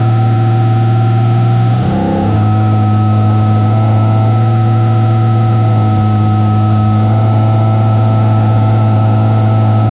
lamborghini aventador svj

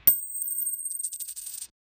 objects

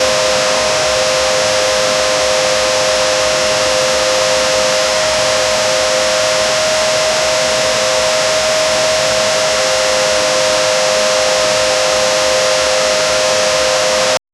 soft gentle vacu, infant

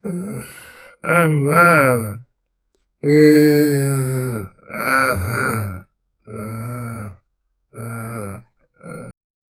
evil man laughing

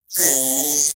zombie insect crushes

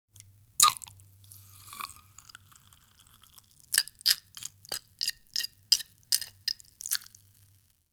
slicing of glass, asmr

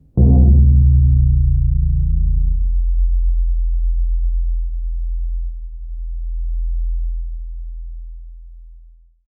rumbling stone abrasion, underwater

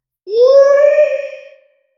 euphoric mermaid ahh

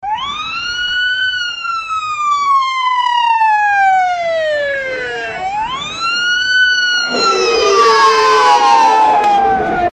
police sirens blasting

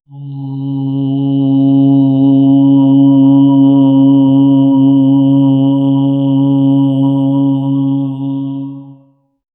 chanting

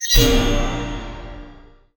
cinematic game sfx of act, power

